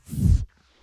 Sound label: Swoosh Fast